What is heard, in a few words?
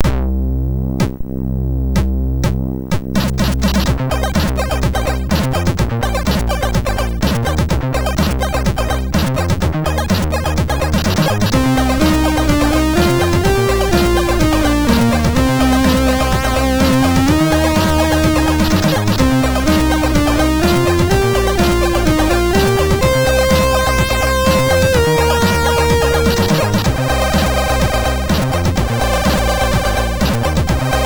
Sound, MOS6581R2